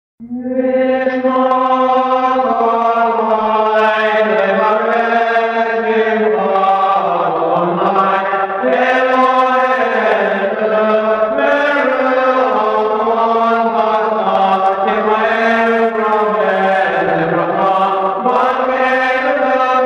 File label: Concluding Music